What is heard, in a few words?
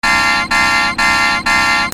ALARMS